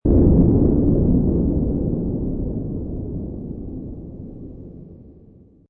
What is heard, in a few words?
Space Scifi Sound Distant Explosion, Far away; Space Scifi sound - Distant explosion: Far away space blast.